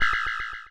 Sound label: Cry